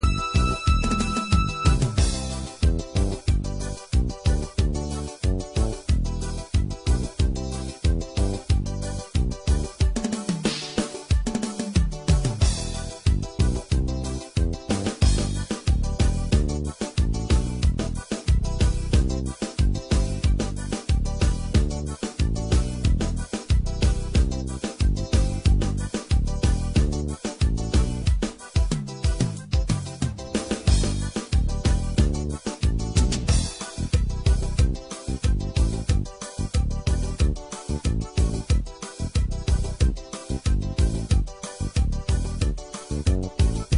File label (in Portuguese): Forro batida